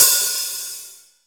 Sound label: nice open hat e key, Royality free open hat one shot tuned to the E note. Loudest frequency: 8287Hz